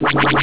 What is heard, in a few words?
xwing shot